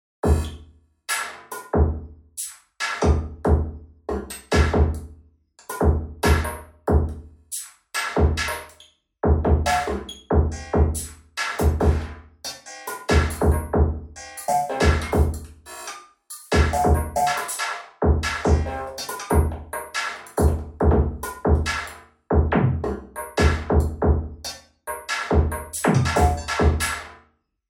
ultrabeat sequence bathroom

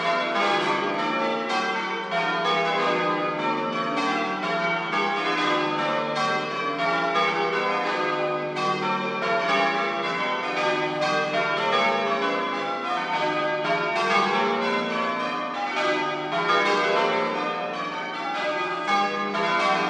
bells of dalton parish church

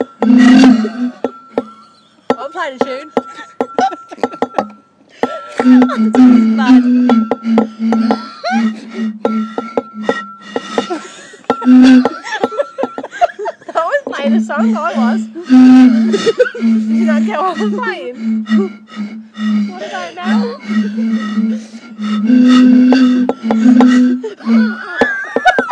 bottle blowing

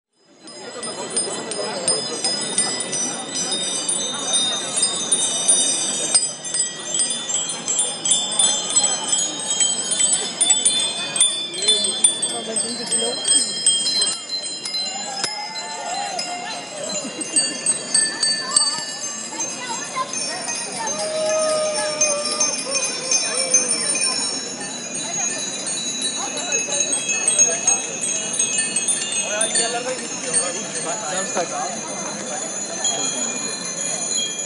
10000 bicycles passing in munich

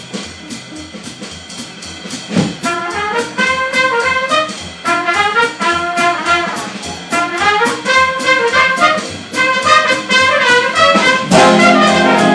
big band

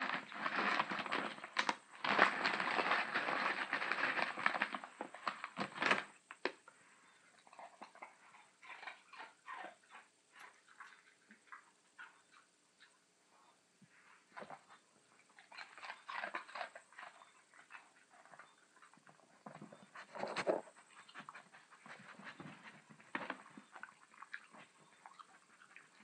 dog likes pita chips

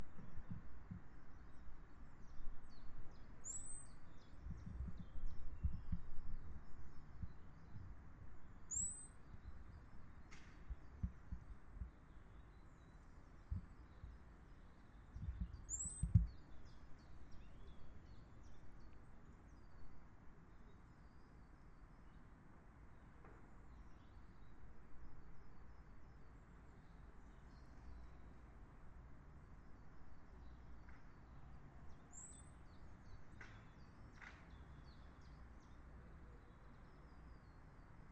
birdsong wings at one point and striking clock

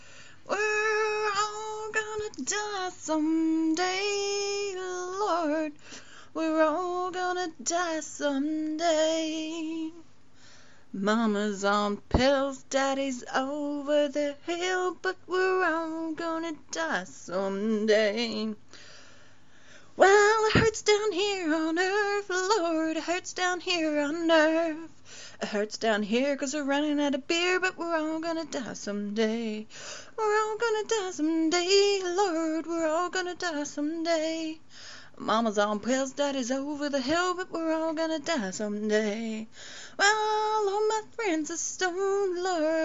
yeehaw

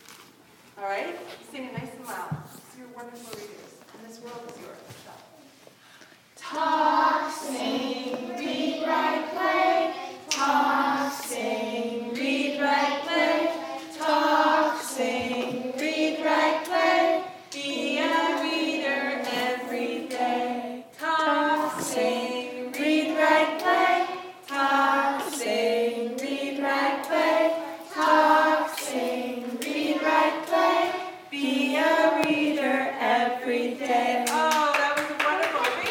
reading song; kfpl

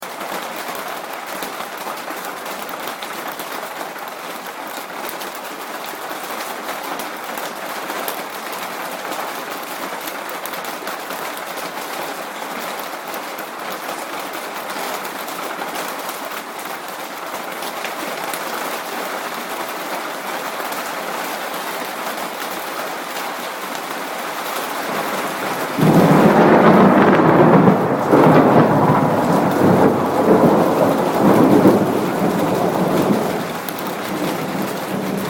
rain and thunder

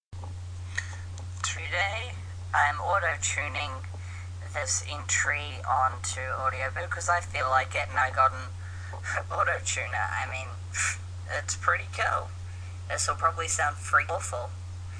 auto tune

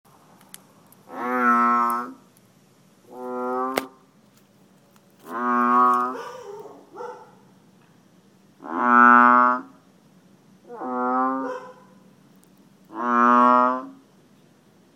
frogs in drain after the rain

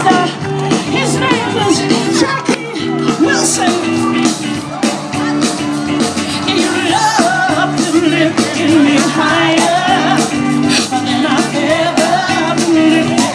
jazz in the vines

